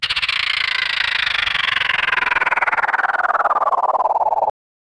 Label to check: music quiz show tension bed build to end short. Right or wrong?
Wrong